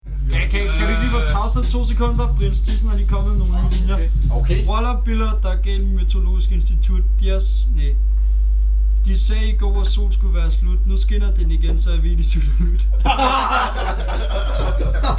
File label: rap